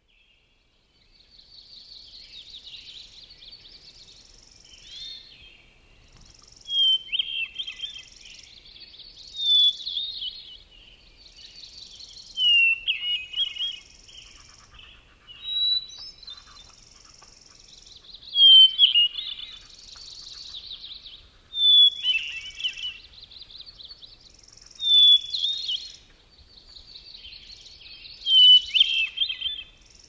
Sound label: hermit thrush